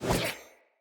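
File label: Sword Whoosh left to right; Weapons; Player; Swords; Fey Sword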